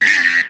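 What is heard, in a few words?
checkpoint; wipeout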